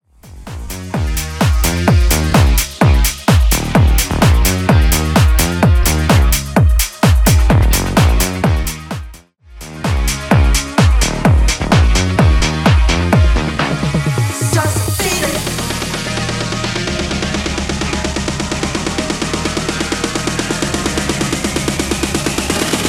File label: Bass House 128bpm